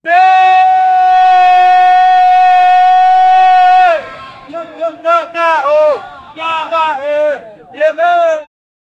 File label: african tribal ceremonial